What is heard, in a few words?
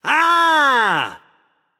yay; smite; voicepack; osiris